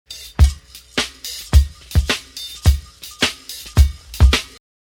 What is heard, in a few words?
Let the Drums Speak